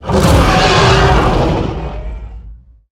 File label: Onyxian Drake Death